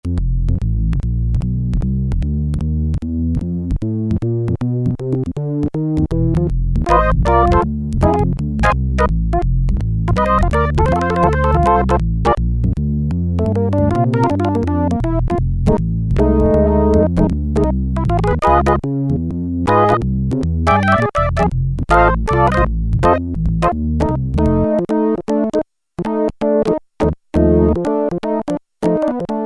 Percussive Organ, Korg Poly-61M

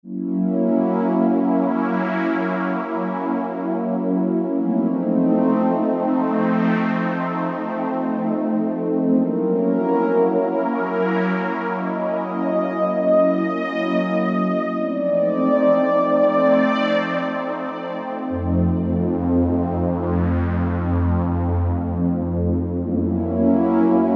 Sawed String; Roland SRX-07 Ultimate Keys

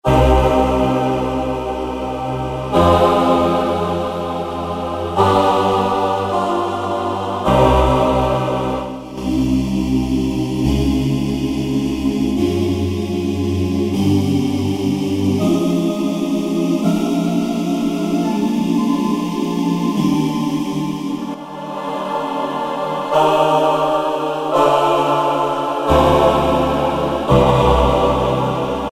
Choir Ooh-Aah, Yamaha A4000; Strings - Choir

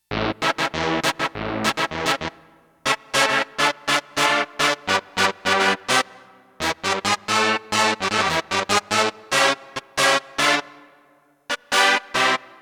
BRASS, Roland JX-1; Factory presets

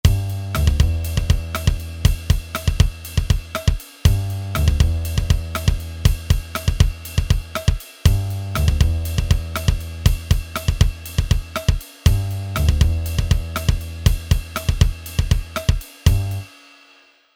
Rap Hip Hop

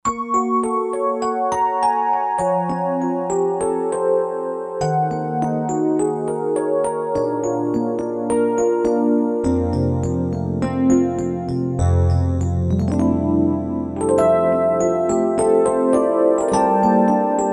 SA Rhodes; Roland JV-2080

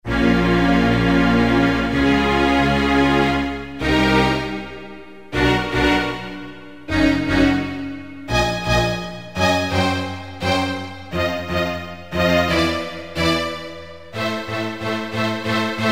Strings

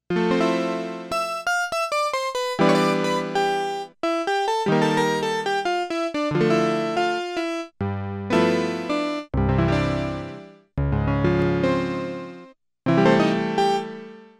Electronic Piano

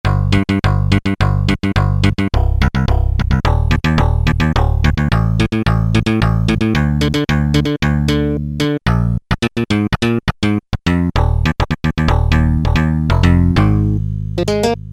Kick Bass; Factory patches - I banks

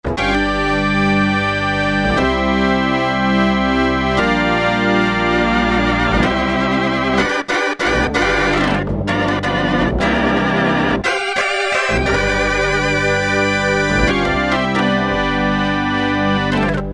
DIST B3, Kurzweil K2000; Kurzweil K2000 Contemporary ROM; Contemporary ROM Programs and Demos diskette

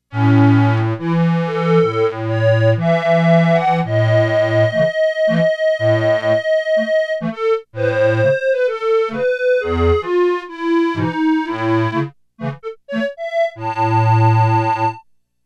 Calliope

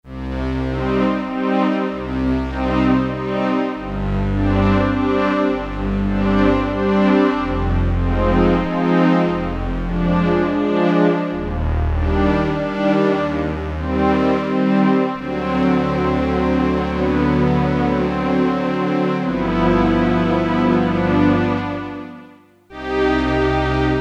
Korg DSS-1 Strings

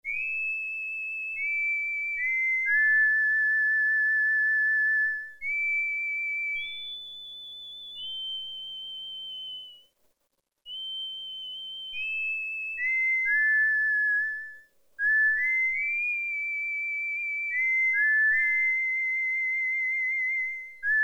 WHISTLE, Oberheim OB-8; Factory Patches

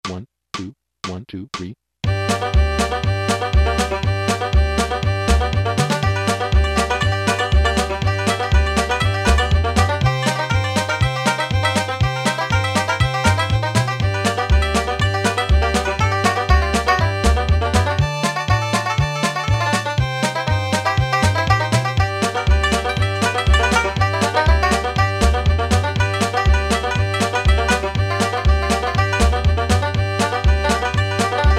COUNTRY & WESTERN, Yamaha PSR-300; Styles

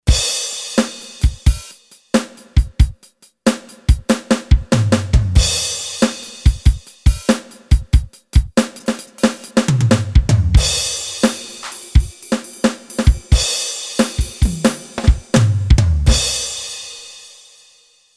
STANDARD, Factory Rhythm Sets, Roland SC-880

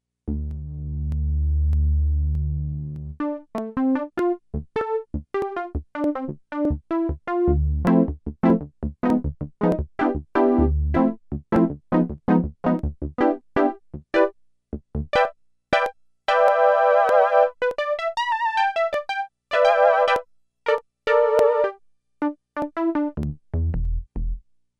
Funk

